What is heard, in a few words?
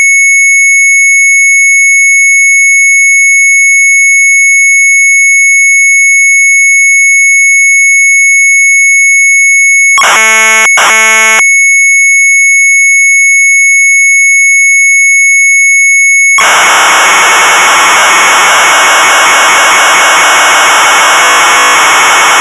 MZ800 Tape; SORT P ADRESSEN; sharp